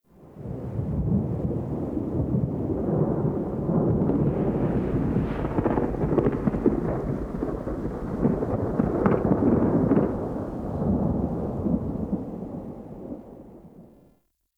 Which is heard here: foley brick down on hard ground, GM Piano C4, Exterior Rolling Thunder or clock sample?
Exterior Rolling Thunder